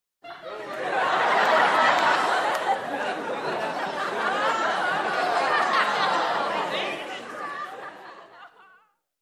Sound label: Crowd Hilarious Laugh